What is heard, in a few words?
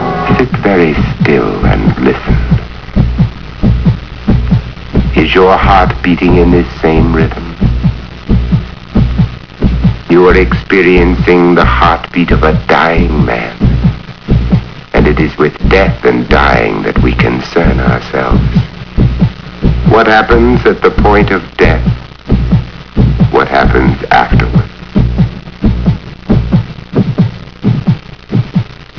hartbeat